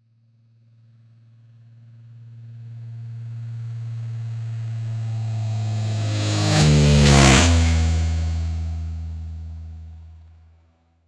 a cross plane V8 that, automotive

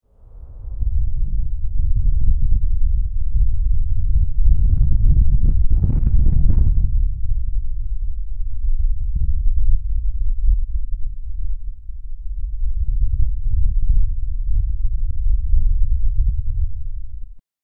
a huge meteorite flies, atmospheric entry

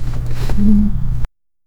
cute anime girl walking; character movement

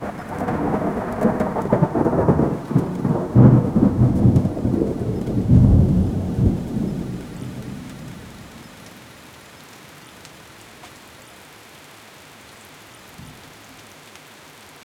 thunder and heavy rain in; 1920s

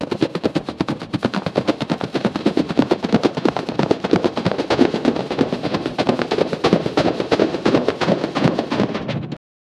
two five army advance foot

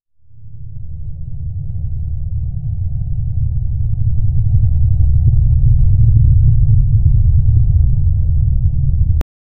realistic ambi, sci fi

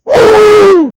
a wolf attacking; dangerous

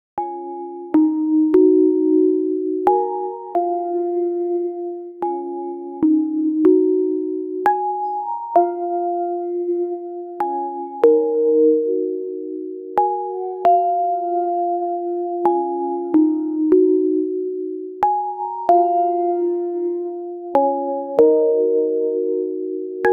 bright synth arpeggios

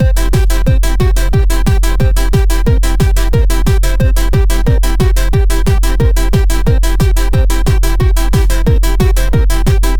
dance90s music non stop

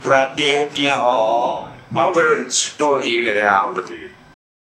vintage boxing ring, announcer; 1930s vintage boxing ring

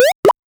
aquatic plap jump fish bu; bubbly

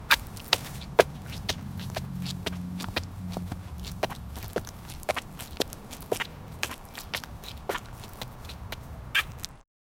small cute puppy walking, dog

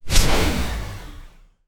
magical spell cast short

